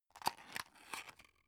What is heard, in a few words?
drug open soft; berufe; arzt